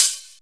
original hat sample a sharp key; drum single hits one shots

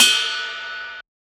big room drum ride G key; cymbal drum

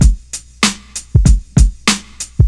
96 bpm 90s drum beat g key

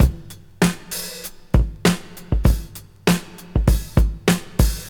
98 bpm old school drum loop sample c key

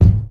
original kick drum single shot g key; Duration 0:01; Samplerate 44100 Hz

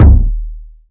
long tail reverb kick sample d sharp key; kick drum

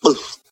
gulp sound big

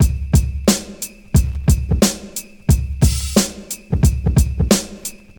89 bpm 1990s hip hop drum loop c sharp key

